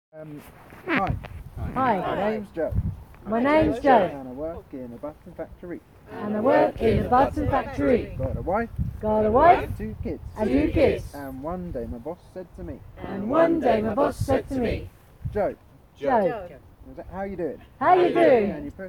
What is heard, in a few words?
Button song